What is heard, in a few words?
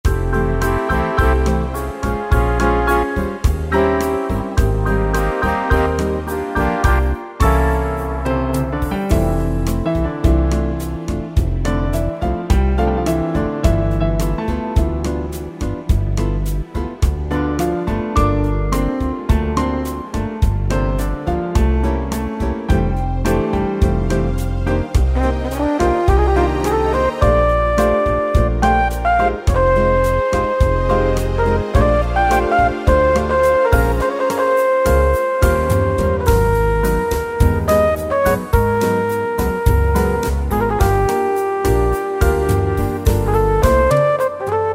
Slow Bossa, Yamaha PSR-3000 style; Factory Demos